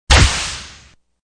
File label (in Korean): 폭탄 먹음; deepsky